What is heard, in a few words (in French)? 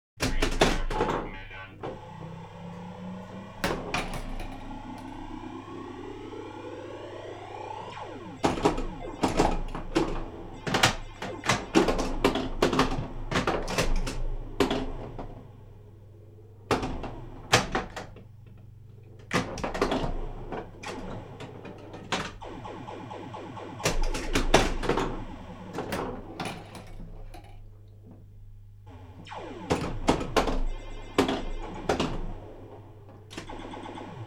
Flipper electronique; bruitage; Electro-menager